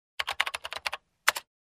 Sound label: Typing message on keyboard